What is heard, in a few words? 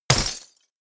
break glass small